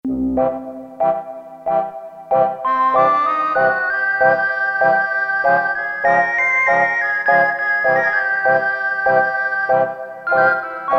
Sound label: Obo Synth; Woodwinds